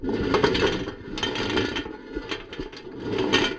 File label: Bucket, Marbles, contact mic; musicradar; contact mic madness; Raw